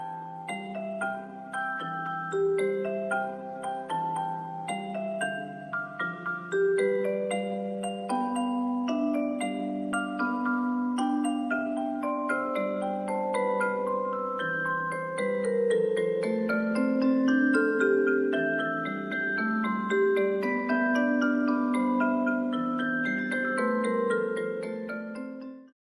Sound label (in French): veilleuse musicale; recif corail; animaux marins; enfants; memoiresdoceans